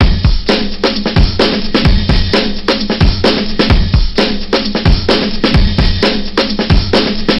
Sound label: Acoustic Drumloop